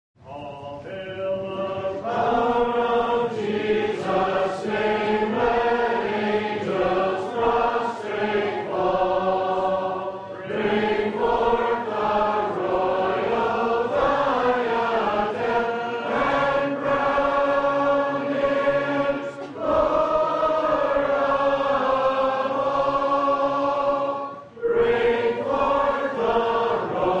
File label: Hymn, Gospel Hall; 2015Conference; BHB 361